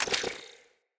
UI Crew Issue Order; Railjack Player Ship

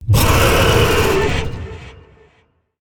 Vocal Accent; Dialog; Warframe; Zariman; Void Angel

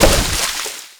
Pool Noodle Slam

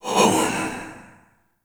Fire Whispers; Weapons; Warframe; Tenno; Grimoire

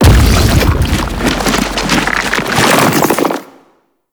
Octopede Lash Limbs Slam, Enemies; Warframe; ManInTheWall

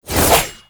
Teleport Attack; Enemies; Abilities; Warframe; Tau; Dax Ninja

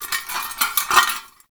Metal Rattle; Warframe; Tau; Ambience

